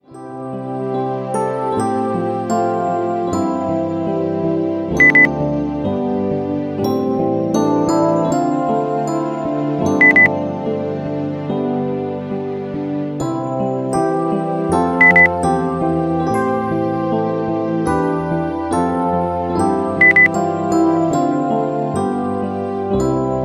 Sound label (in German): weihnachten alle jahre wieder beep